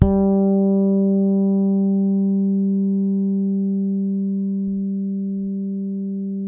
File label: HARMONIC G, PEDULLA BASS; Masterbits CLIMAX 7 - Bass